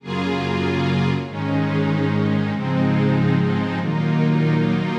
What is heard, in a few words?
Strings 96 Bpm